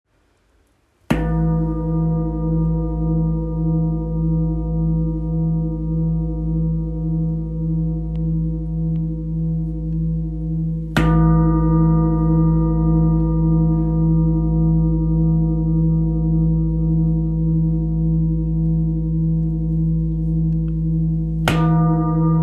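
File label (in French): Gros bol La, bol chantant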